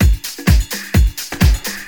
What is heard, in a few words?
Discohouse Loop 128 BPM